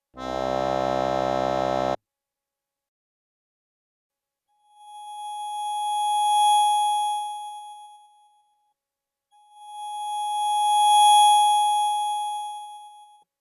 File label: reed response organ sampler